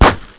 CRACKER, 爆炸; 爆炸系列声音素材

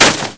CRASH, 碰撞声音素材

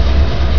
train; 机器; TRAINLUP

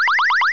LASER; 激光

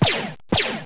ZAP

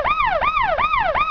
siren, 警报

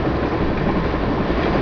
TRAIN

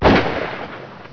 GUN

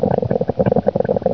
rumble; 机器

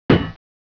THUD, 碰撞声音素材

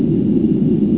HUM, 机器

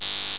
laser

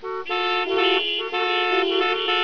HORN, 汽车; 汽车声音素材

